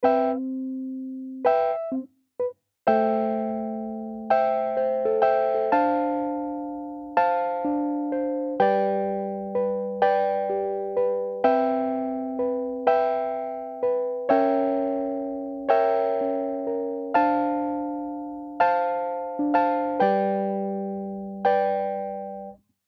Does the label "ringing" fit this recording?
No